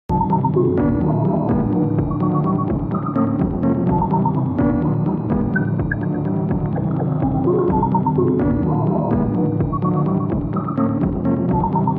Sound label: music idol carry lp 141bpm; Omori sound replacements